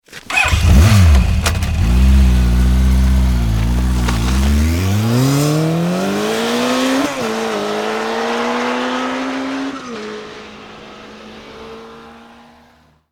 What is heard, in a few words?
Motorbike Honda 1100 Start and Away Fast; Close shot; Motorcycle; Tom Hutchings